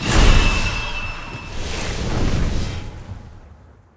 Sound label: wild Hell Hit; archangels